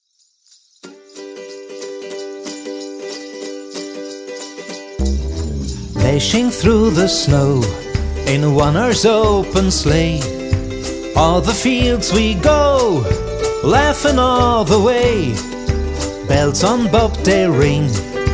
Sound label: jingle bells